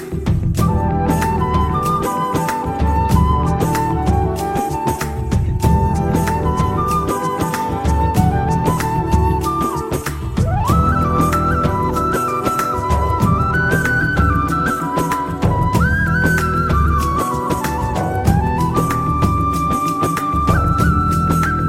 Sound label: relax music